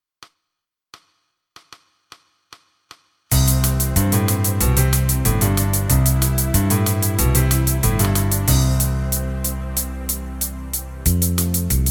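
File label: C, backing tracks irish country pop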